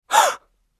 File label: Female Surprised Deep Inhale